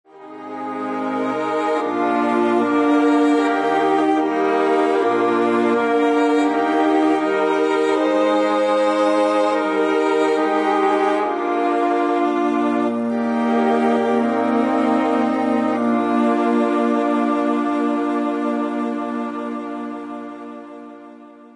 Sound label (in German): fluch oder segen playback